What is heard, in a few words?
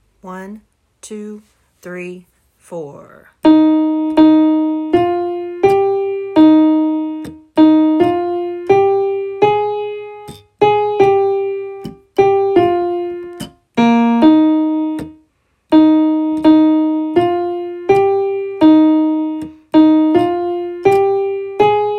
winds